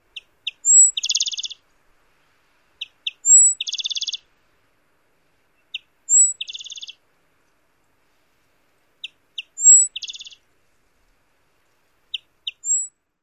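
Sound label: songs, Rufous-winged Sparrow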